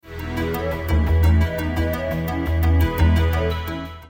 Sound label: korg poly800; 8-DCO; 49-note; Demo Excerpt (Vintage Synths)